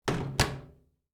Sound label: microwave oven close; appliances